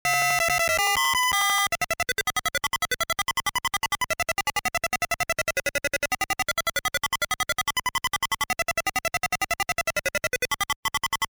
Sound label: ~Bataille~ (Encounter ~ Battle Food Empty ~ Victory)